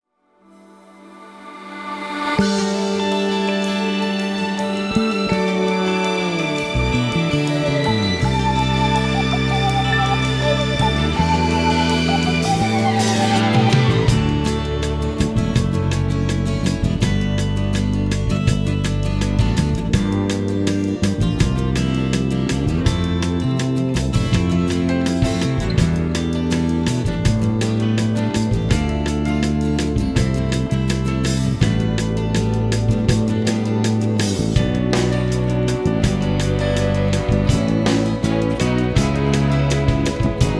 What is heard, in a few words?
Opening Instrumental; Tetris with Cardcaptor Sakura: Eternal Heart; PS1